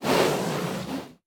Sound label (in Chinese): 熊猫攻击型声